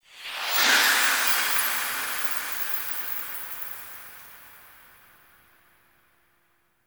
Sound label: Portal; Ballas Lotus Operator Intro; Warframe; The New War; Cinematics